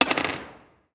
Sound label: the d6 roll